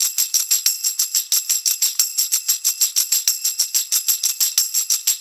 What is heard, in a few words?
PERCS L, Roots Funk; USB Soundscan vol.41; AKAI